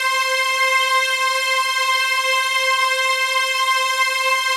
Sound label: PAD, Dance Mega Synth